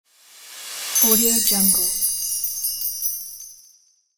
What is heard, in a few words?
magical christmas transition